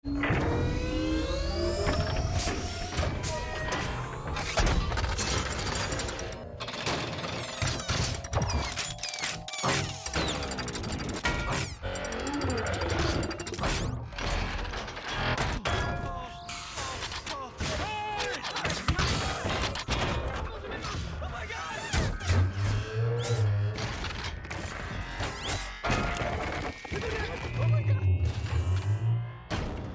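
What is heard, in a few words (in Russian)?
звук трансформера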